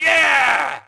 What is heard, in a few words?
crowd; Tony Hawk's Project 8; XBOX; human